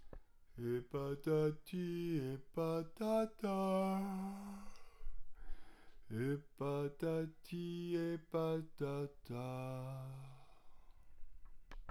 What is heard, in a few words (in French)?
refrain lent